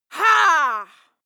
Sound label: female fight attack cry; schreie; menschen